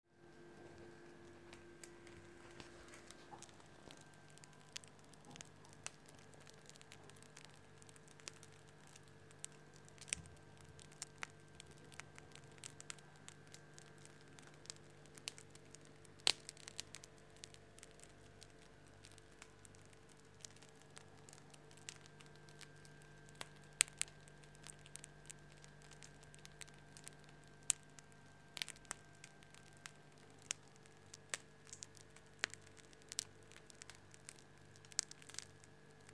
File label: Something is cracking